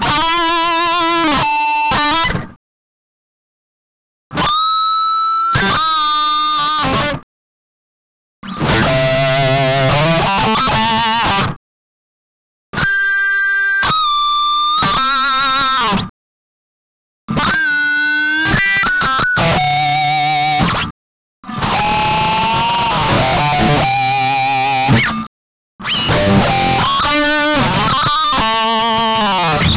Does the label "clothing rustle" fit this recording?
No